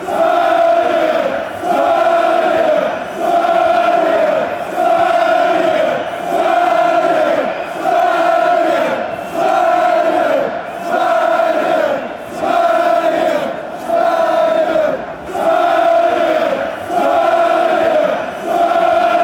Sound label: sweden slow fanchants